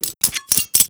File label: knife look, weapons; Counter Strike Model Pack; CS:GO; bknife